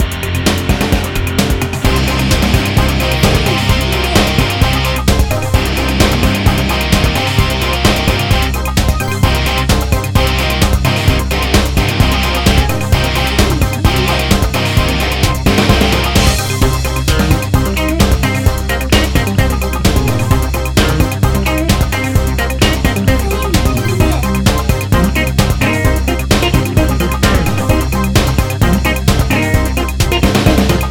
摇滚 战斗 激烈

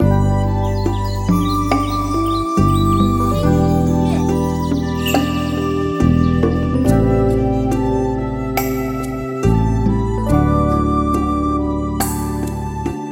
门派-白天

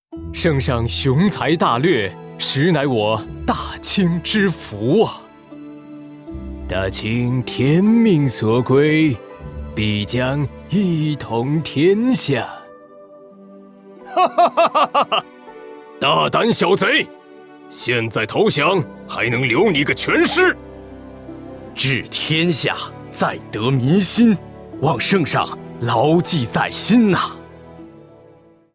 男 BGM

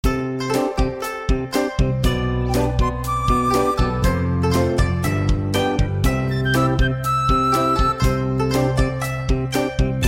活泼 轻松 欢快 中国风

